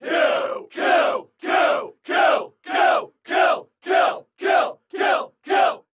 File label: crowd kill kill kill